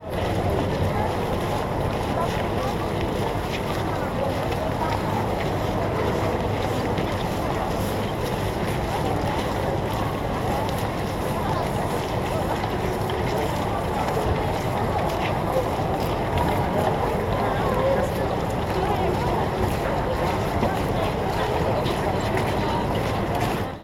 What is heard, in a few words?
Old Town Crowd On Thawed Street